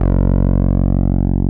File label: TB C5, TB-303; Masterbits - Soniq Elements; TB OVERDRSET